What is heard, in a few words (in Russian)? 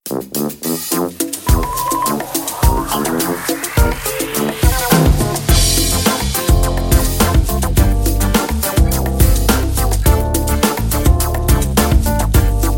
минусовку